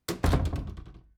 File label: Door Basement Close; this is the zodiac speaking 2020 gamerip switch ps4 xbox one pc macos